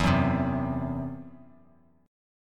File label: E Suspended 2nd Sharp 5th E, down; Esus2#5 strummed